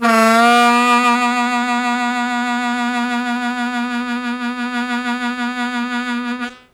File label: Strong Vib w Scoop B0, Harmonica; Zero G